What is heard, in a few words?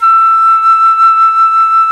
Jazz Flute